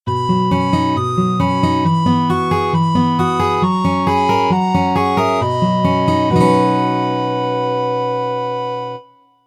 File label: Jingle; Dyglone